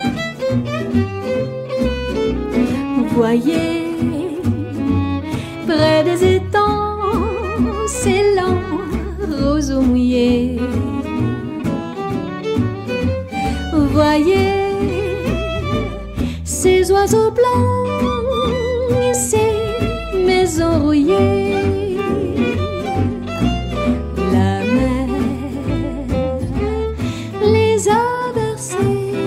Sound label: Jazz Band